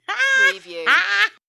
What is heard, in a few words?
Old Lady Laugh